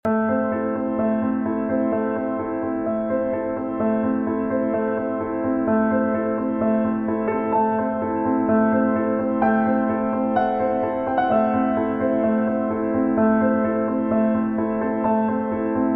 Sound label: Dreamy Piano